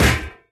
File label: physics turret impact sm